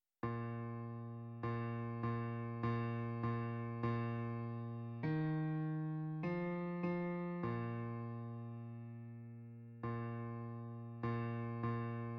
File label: Bass